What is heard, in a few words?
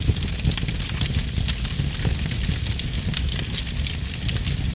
Fire Fireplace Loop